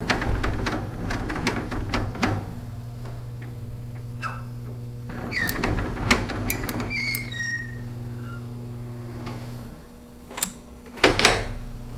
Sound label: washing machine door unwound and opened; doors